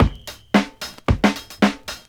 Drum Loops

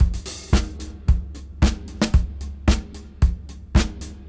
113 BPM; AMG; Keith LeBlanc Phat Butt Jams

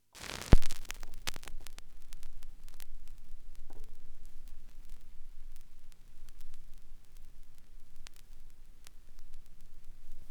vinyl; Masterbits; Vinyl Attack

